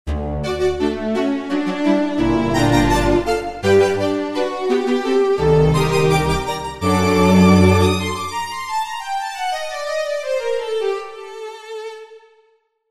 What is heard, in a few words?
All Strings